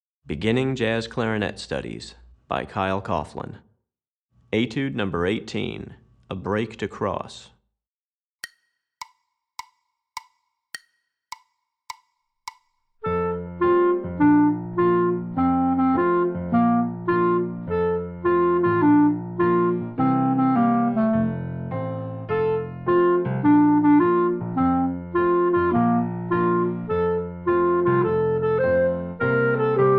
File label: Etude, Jazz Clarinet; Recordings For Beginning Jazz Clarinet Studies, Volume 2; Etude #18